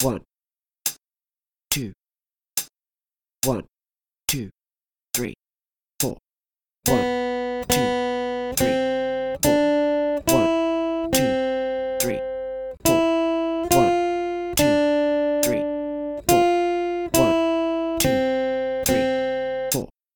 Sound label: 2 Strings Longs, guitar